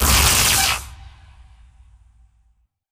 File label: bullet energy titan arc; Titanfall 2